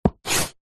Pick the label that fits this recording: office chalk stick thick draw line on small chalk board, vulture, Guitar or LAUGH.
office chalk stick thick draw line on small chalk board